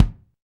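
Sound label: bass drum, BD Real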